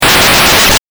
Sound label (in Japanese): 8bit 消滅